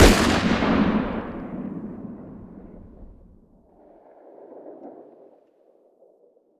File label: fire dist 50ae pistol ext; gmod; arccw uc commons attachments